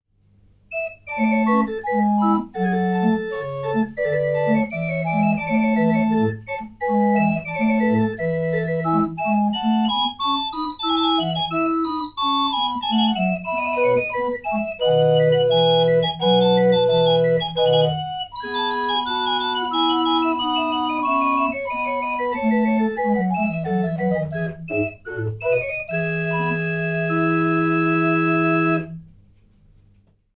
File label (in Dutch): Holfluit met Woudfluit